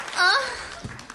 GROAN UHHH

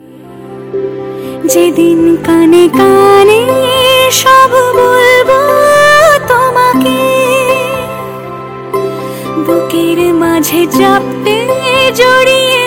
song ringtone

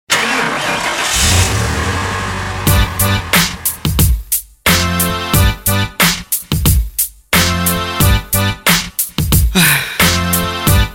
RAP SONG RINGTONE

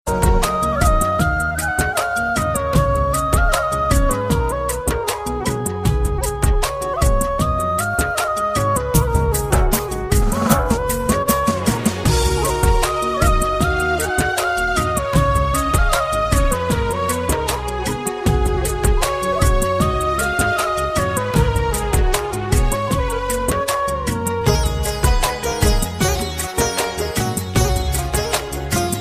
cute cute flute ringtone

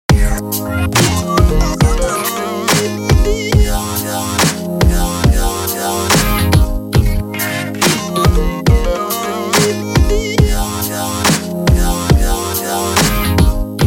electric wave ringtone

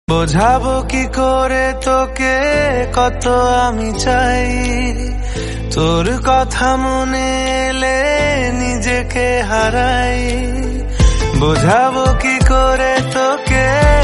MALE VERSION RINGTONE

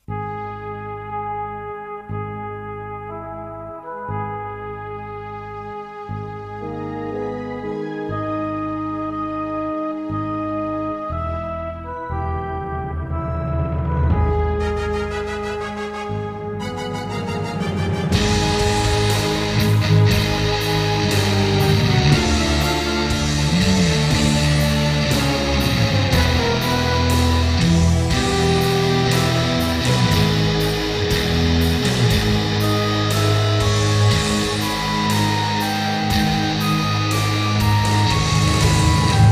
HEAVY METAL RINGTONE; STAR WARS

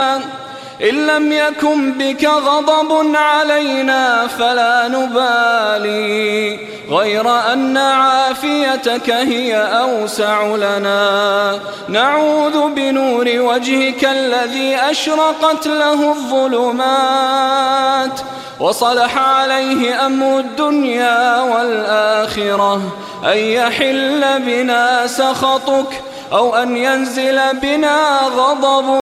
Islamic dua ringtone

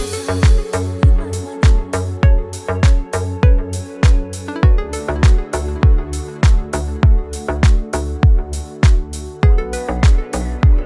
instrumental ringtone

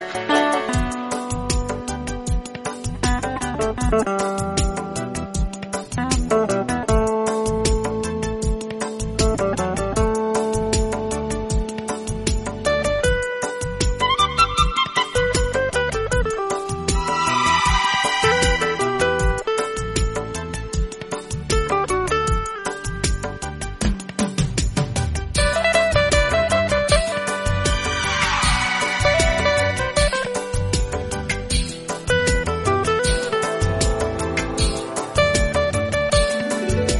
INSTRUMENTAL RINGTONE

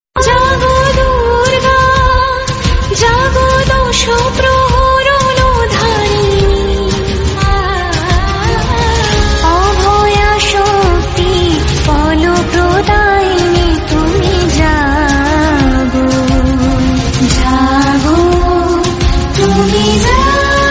MAHALAYA GAAN BENGALI RINGTONE

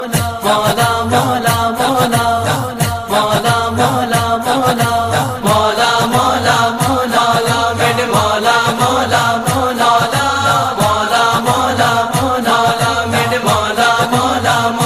naat, ringtone

